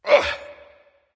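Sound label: nonverbal grunt lift; smite; voicepack; ullr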